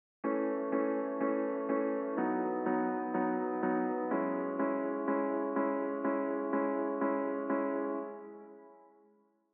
Piano

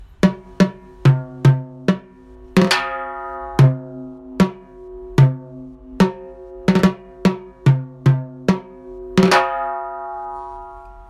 timballes

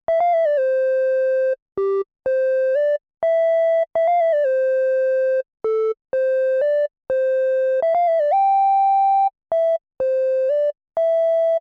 synth lead dry

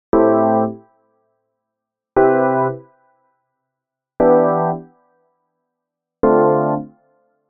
E. Piano

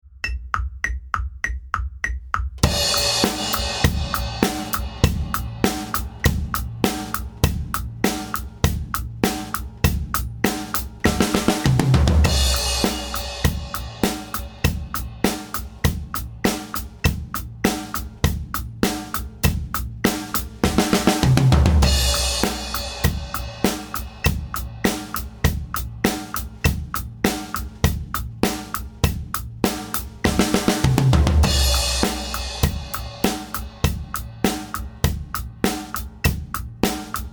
Drum

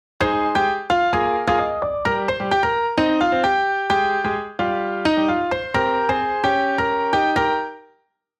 Piano

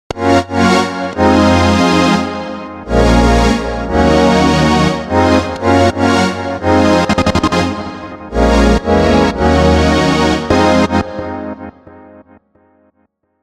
Synth

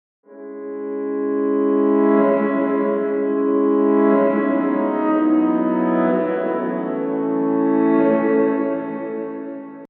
Reverse Piano

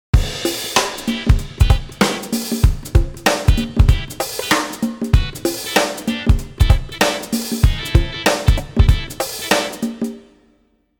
Guitar

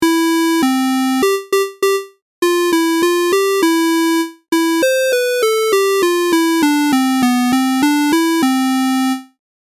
Square wave

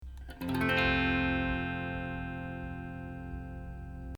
f chord

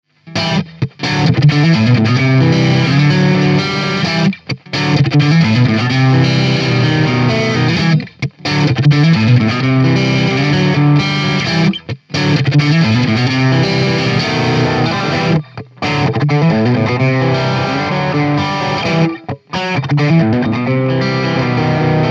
British Lead 800

